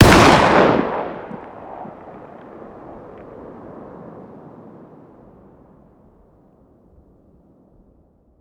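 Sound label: fire distant; gmod; arccw uc commons attachments; ub12ga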